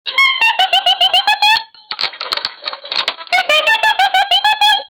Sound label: a cash register cha chin; retail